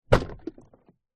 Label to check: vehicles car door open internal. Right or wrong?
Right